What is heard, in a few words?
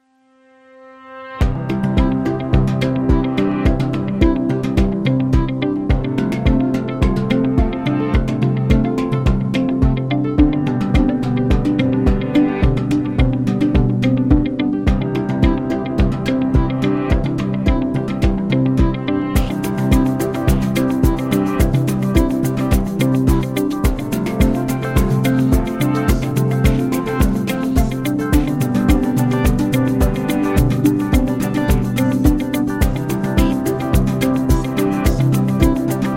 Motivational Corporate Background, corporate music; corporate music vol 6